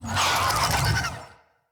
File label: Infested Big Ashen Vocal Attack Death; Enemies